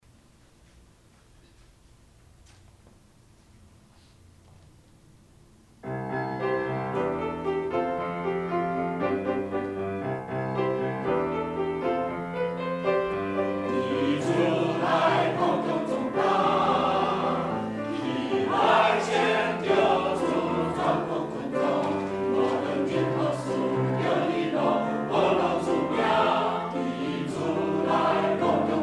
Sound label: Choir; taiwanese